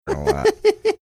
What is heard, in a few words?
giggling